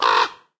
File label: AMB Village; rabbids multiverse; iOS, macOS, Mobile